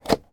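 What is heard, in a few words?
van fan duct vent direction left right dial mercedes sprinter; transportation; sound effects; sound effects one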